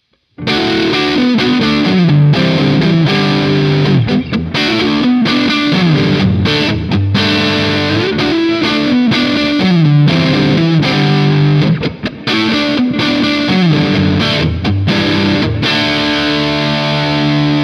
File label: Amplitube Max JCM100 mit EQ